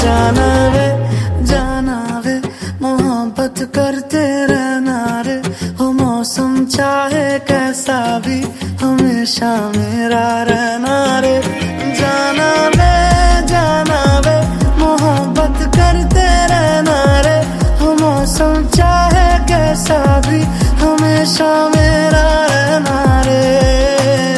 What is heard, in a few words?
New Bollywood Song Ringtone